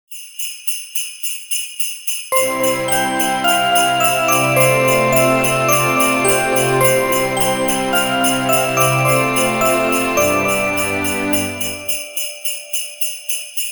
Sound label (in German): Stille Weihnacht